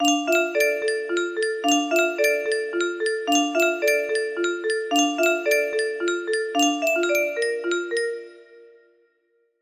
haunted melody, music box melody

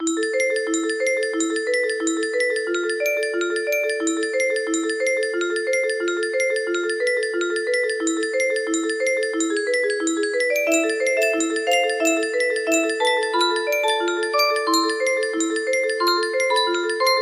tension, music box

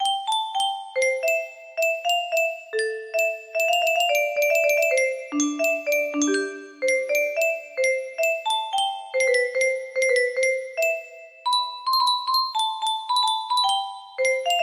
trumpet

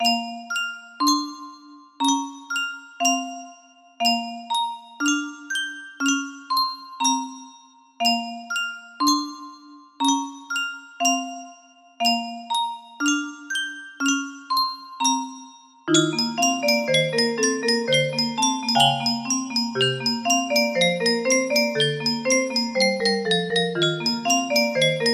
piano

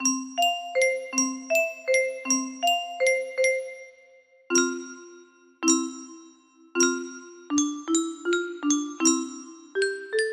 yamaha grand piano, music box

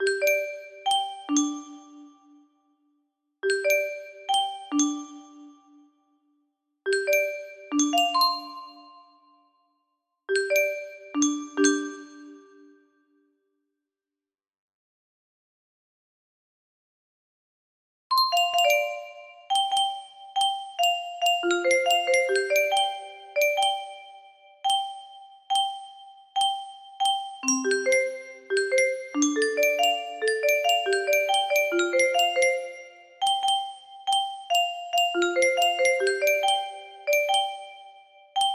piano

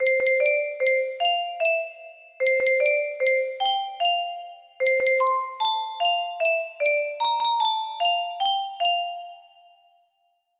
happy birthday to you, music box

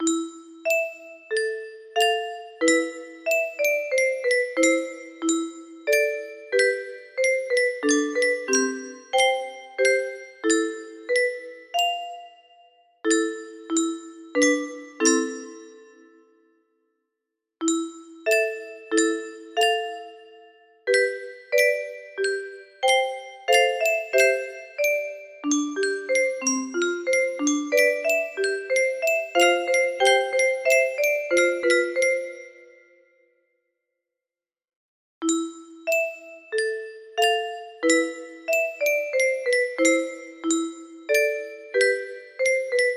piano; resident evil code veronica

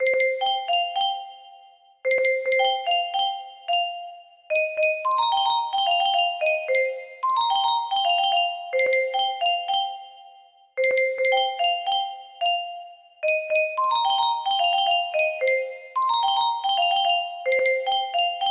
music box ver

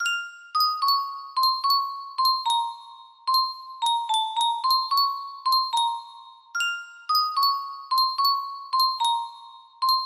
creepy music, music box

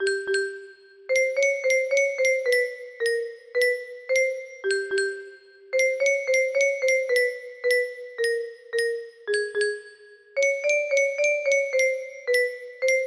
music box cover

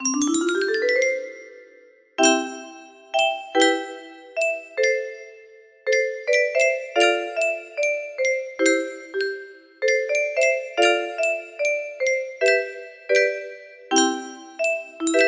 music box version

